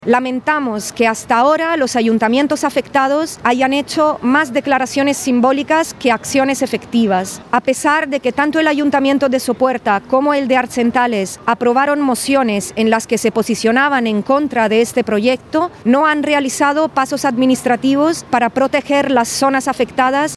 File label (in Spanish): EOLICO